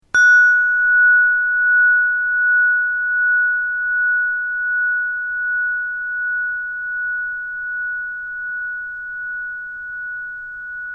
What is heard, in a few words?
trigon jupiter